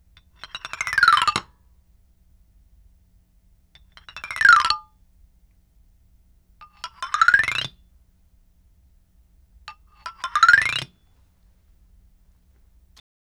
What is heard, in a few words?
Xylophone